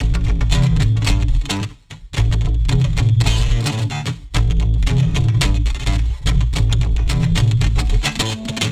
110 A Crunch Riffer, Warped Bass; Loops; Touch Loops; CosmicFunk

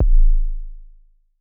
D1 Pure Tuned Kick, Tuned Sub Kicks; One Shots; Touch Loops; ElementsOne; Abstract Rhythms

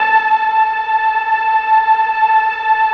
PAD; PADS 2

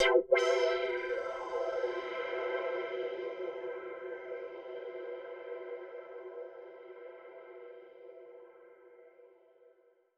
TECHNO EXTRA 140

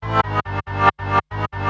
TECHNO SYNTH 140; Keys

